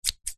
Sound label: office scissors large single snip; zapsplat